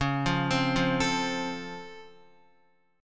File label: C sharp Augmented Major 7th, Guitar; arpeggiated; 4F,4A,5C,5G,5C,6E; C#+M7; x,4,5,5,5,5; JGuitar